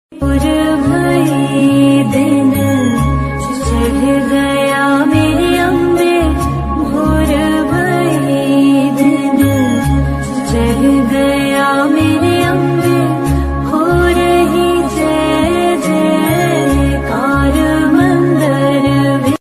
navratri ringtone, mata ringtone, ma durga ringtone